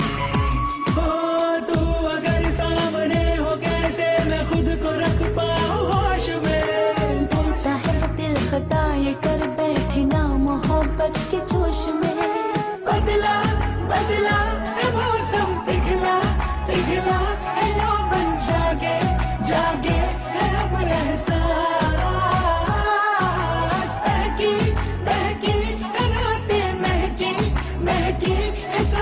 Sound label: Rain Dance; Katrina